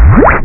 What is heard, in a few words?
other entrance portal; Stardust 1.1